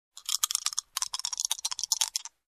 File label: Dice Roll